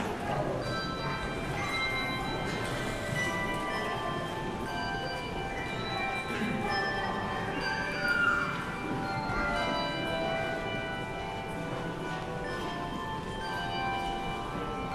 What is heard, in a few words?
Handbells; Lamb of God